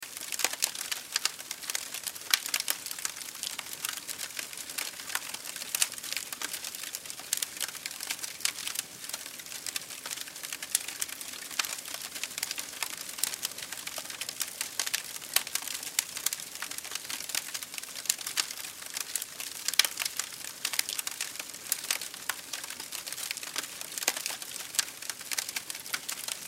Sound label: Campfire Camping Wood Crackle Loop; Flames; Objects; Flame Sound Effects